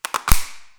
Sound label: cracker; big w